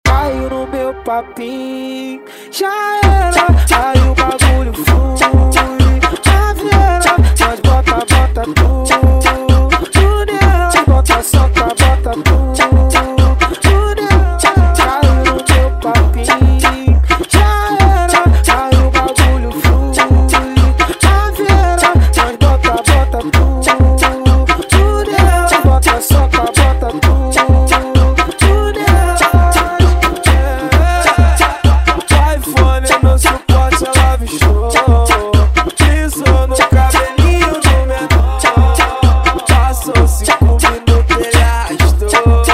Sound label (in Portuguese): ritimada agressiva